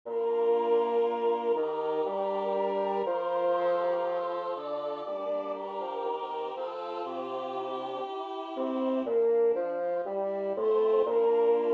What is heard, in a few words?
bass; Hodie